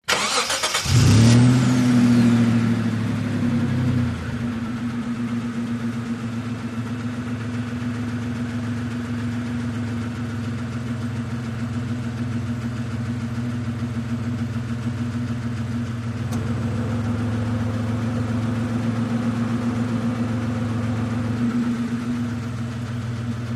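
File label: Montero Start Idle Fan, 1998 mitsubishi montero ext.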